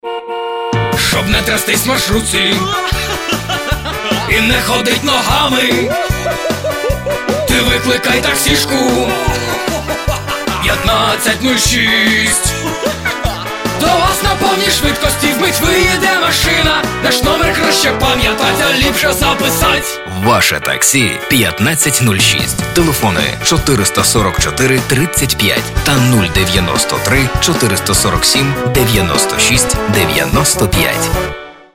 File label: Vocal